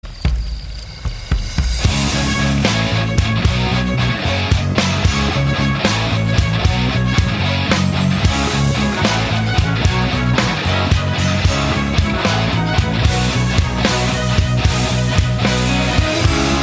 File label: Metal; Skyrim